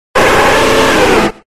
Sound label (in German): Ruf